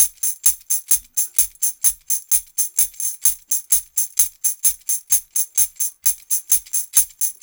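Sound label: TAMB, tambourine; Drumdrops In Dub VOL-1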